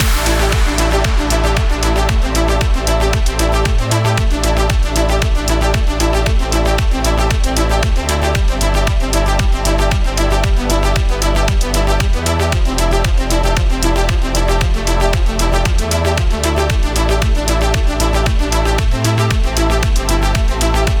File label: Raining City Main Loop; Metropurple; ArcRacer